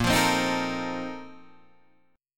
Bb Major 7th Suspended 2nd Suspended 4th, Guitar, Open G